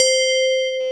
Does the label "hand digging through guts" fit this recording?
No